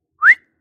whistling call

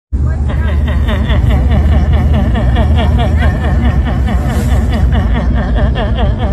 car ignition laugh meme

